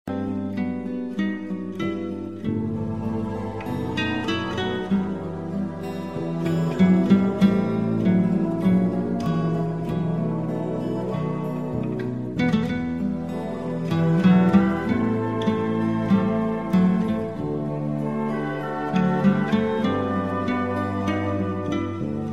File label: slow guitar